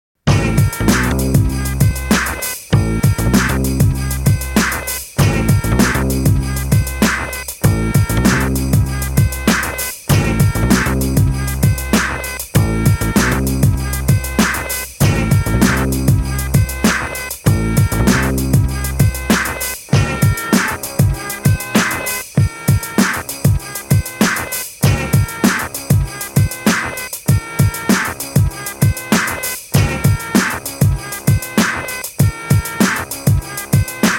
Chaos Groove

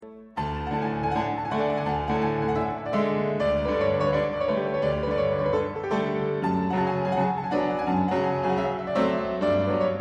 Romanian Folk Dances, Piano

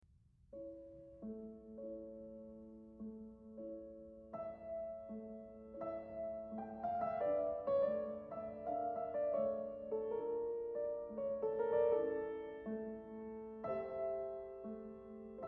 Romanian Folk Dances, Piano Music

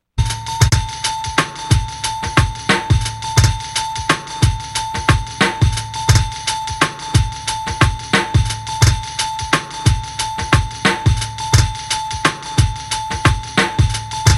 Gangsta Bells

